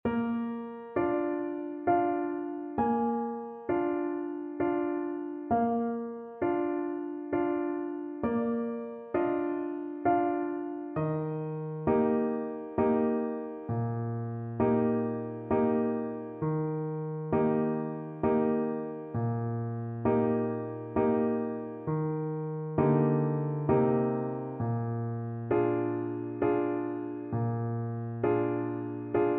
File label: down in the valley trumpet